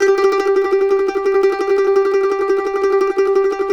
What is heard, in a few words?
MANDO TREM G4, Whole Lotta Country; Sampleheads; Whole Lotta Country 1 & 2